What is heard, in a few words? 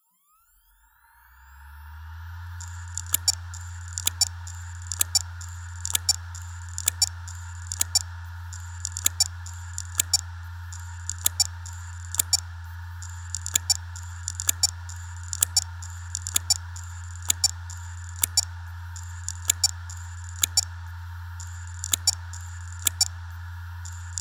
WDBBEP0010BBL Headcrash